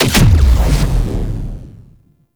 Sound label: Sniper Punch; Weapons; Warframe; Sentient; Caliban Prime Sniper